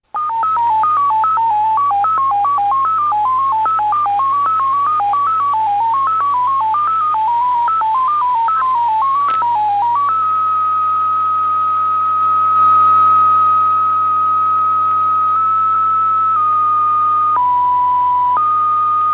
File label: high pitch polytone; irdial